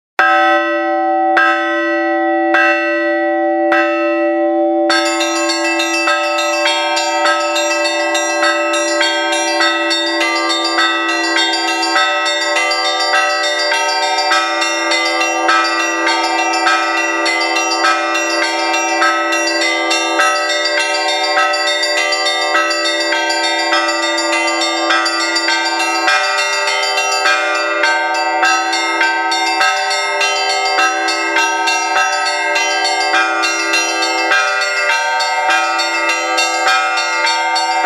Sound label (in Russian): zvon